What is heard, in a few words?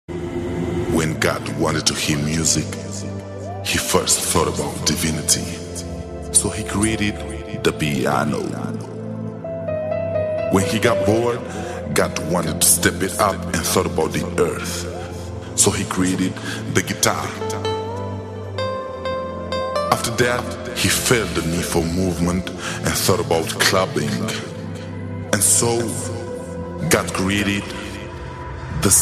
Saxo Club